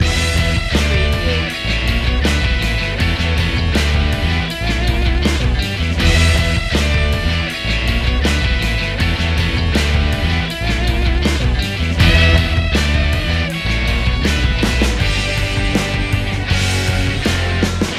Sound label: truck power loop